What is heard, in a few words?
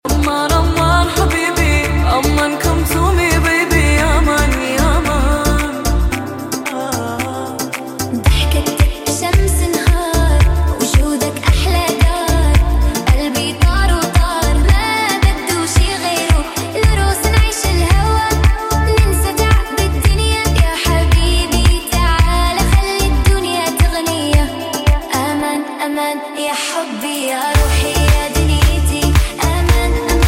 arabic music